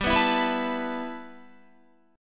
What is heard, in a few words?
G 6th Add 9th G Guitar, chord; G6add9 strummed; 15,14,14,16,16,16